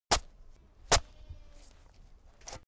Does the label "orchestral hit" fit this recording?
No